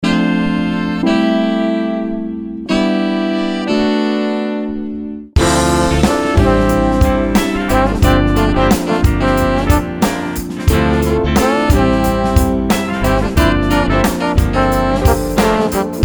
Funk Soul Ensemble

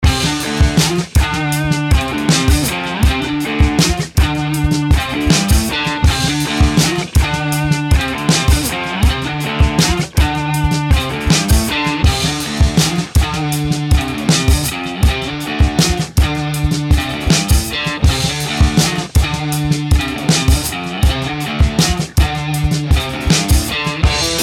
Indie Rock Guitars

